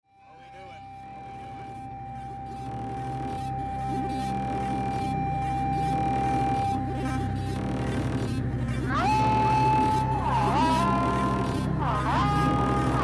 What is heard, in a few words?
noisy ii this is noise